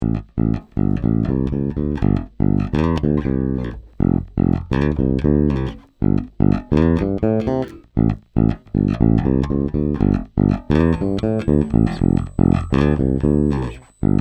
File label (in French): Actif C, basses, sadowsky